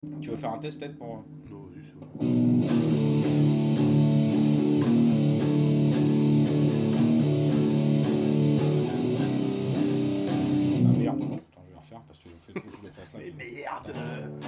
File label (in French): Riff